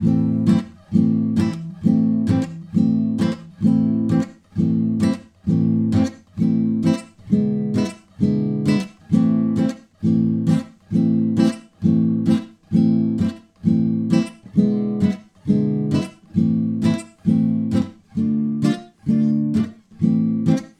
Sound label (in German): Gitarrenbegleitung